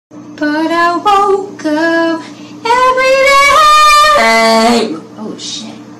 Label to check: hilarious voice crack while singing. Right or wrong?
Right